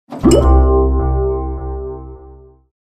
Cauldron appear

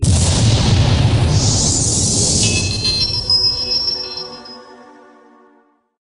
unused time bomb explode; BejClassic

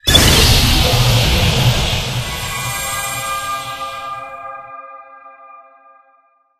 Doomgem destroyed

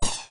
firework launch; BejClassic

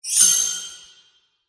explosion; HorseShoe

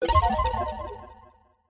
SFX scramble; Bejeweled3

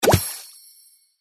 Watermelon explosion

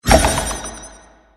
explosion; Aquartz

